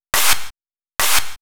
Clap, 140 BPM; Pitched; Vengeance Samples; Vocal-Hook Kits; Song Kit; If You Know

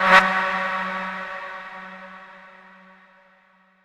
Tonal FX F#

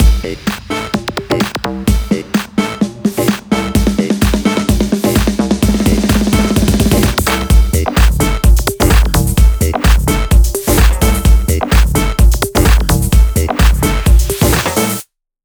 128BPM Mix; Vengeance Samples; Cool Kit

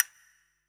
Percussion; Vengeance Samples; VEH2

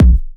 Bassdrums Trance, Trance Kicks; Vengeance Samples

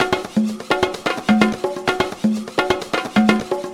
Latin House Loop 128 BPM; Vengeance Samples

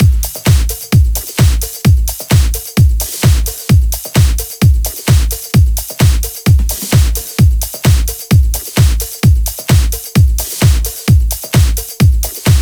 130BPM Kit; VFH1 130BPM Remnant Kit

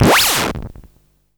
Sweeps Short

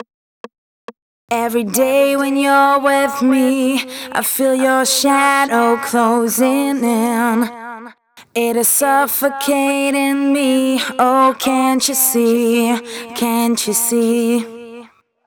Verse B FX, 136 BPM; Vengeance Samples; Breakaway

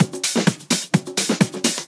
Percussive Loops 128BPM; Vengeance Samples

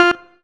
Synth Shot; Vengeance Samples

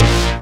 Synths A#; Vengeance Samples

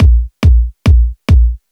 Kit 140BPM Kick 4 4; Gminor; Vengeance Samples; VTS1 Devastating Truth Kit

